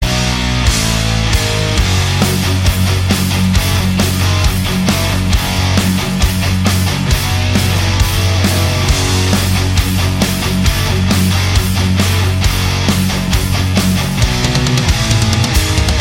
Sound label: Metal